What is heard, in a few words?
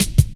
light stepz cut, drum loops; Zero-G Jungle Warfare 1, 2 & 3